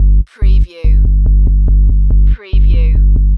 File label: Techno-Trance Sub Bass Loop in G 142 BPM; lynne-publishing